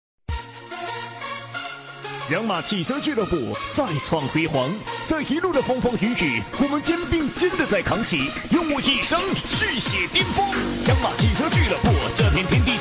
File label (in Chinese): 家族战歌